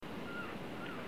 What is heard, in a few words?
evp dorm hallway short; museum